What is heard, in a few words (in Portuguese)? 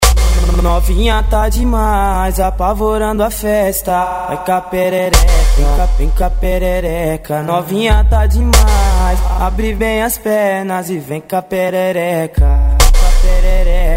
eletronica; parana edicao fervo 2016